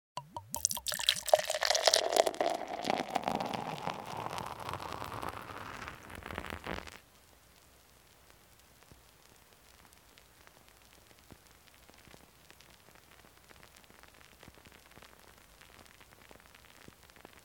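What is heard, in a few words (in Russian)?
with bubbles, foam settles slowly; Звук наливания пива; Наливания из бутылки, из банки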